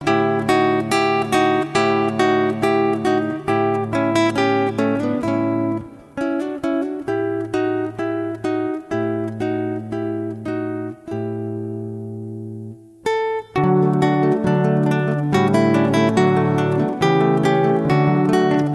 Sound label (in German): sonate fuer floete und gitarre in d dur rondo; christian g scheidler